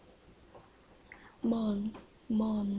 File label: moan